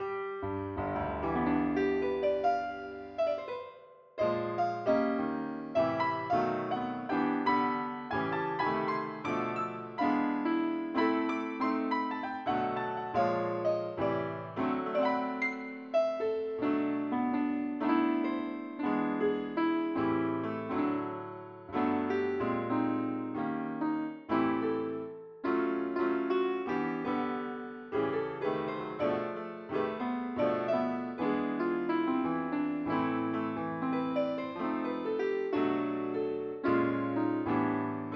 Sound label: piano